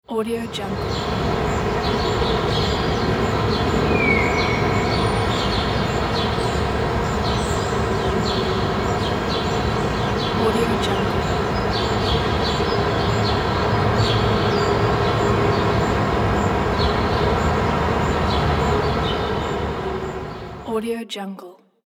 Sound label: big city square ambience